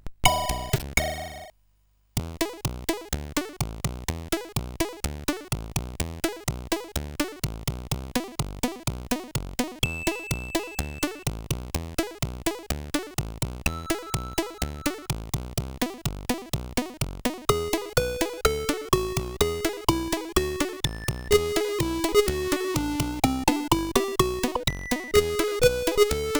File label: sid CSG8580R5